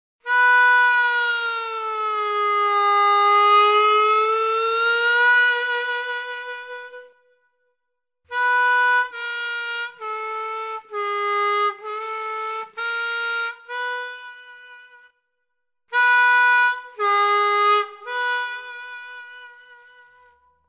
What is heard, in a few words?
bend, harmonica